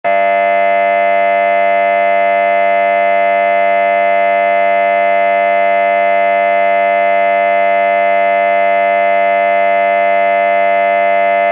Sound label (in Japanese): 劇場やホールの開演ブザー 15秒 リバーブ無し; 演劇・舞台